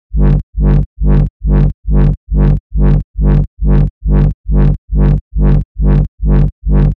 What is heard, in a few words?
Reverse Bass Loop G (138 BPM)